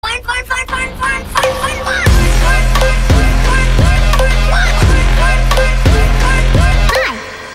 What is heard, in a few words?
kazoo; taunts; stompinggrounds; props